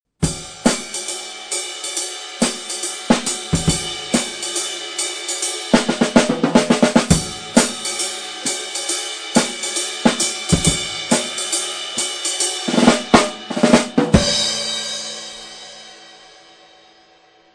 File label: drum set; percussion